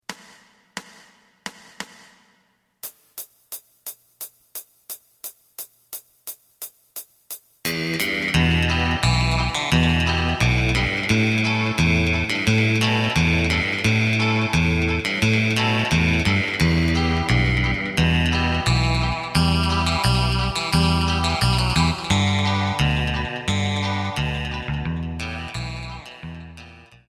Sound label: drum